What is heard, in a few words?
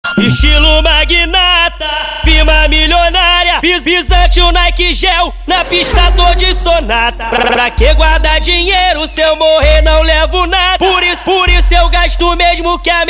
music